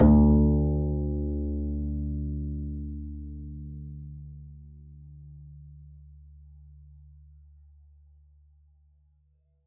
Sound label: pizz d2, double bass